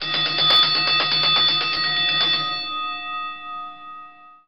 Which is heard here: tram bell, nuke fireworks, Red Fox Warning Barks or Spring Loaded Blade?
tram bell